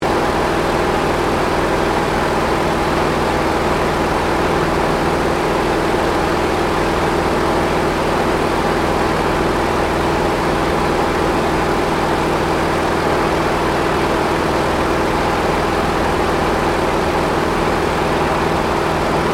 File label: AIRCRAFT T-28D TROJAN FLYING INTERIOR; Airplanes